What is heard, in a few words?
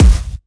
Kick; Ableton Live 12 Lite; Core Library; Drums